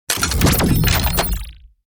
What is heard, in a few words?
Reload Large Laser Power Injection; Robobeat